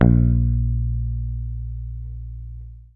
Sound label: HOFNER SUS A#1, Bass; Sampleheads; Will Lee Bass Library